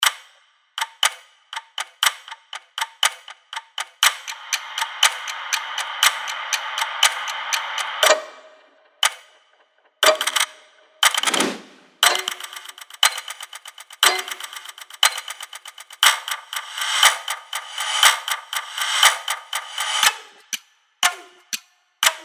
Effective Trailer Clocks

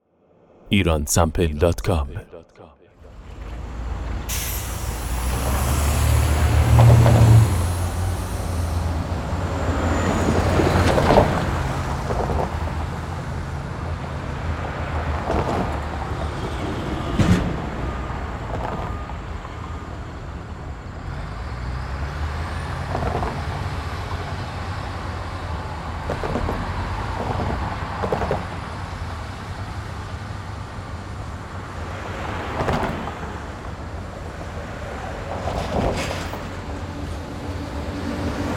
traffic; Iransample

